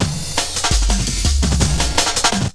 Essential beats; FM 57 - The Ultimate Sample Collection (1997)

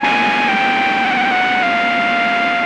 EAST NOISE, TEXTURES; ILIO; Trance Fusion

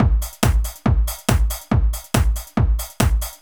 Kick Loop, 140bpm

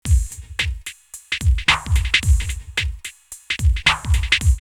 808 SwingBeat 110, drumloops, DrumMachines; X-Static Goldmine

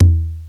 LOW DRUM, PERCUSSION; Crypton - DrumBase5000

